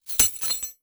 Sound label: purchase